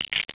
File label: splat